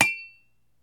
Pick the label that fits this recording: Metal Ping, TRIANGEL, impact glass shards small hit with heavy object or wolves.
Metal Ping